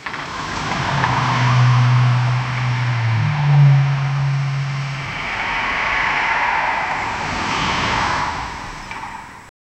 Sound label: sound of a car slowly; driving